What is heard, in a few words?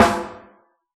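pop kit snare; Giga Samples Collection; Dr 202 Kit 1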